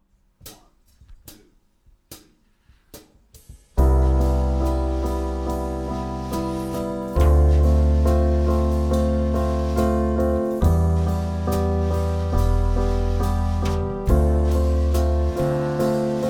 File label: backing only, jazz wind